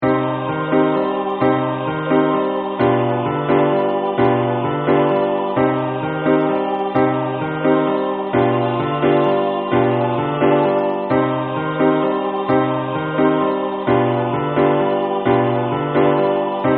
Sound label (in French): piano; abendlied